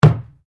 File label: Sport Ball Rubber Bounce Wood Hollow Interior, Rubber ball; Bounces; Objects